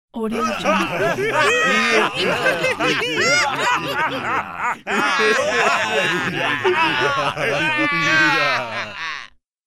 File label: bad men group laughter